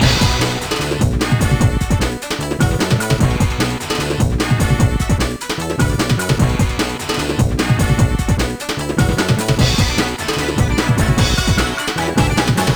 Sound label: Opening Loop